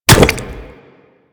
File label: Goo Gun Sack Land Large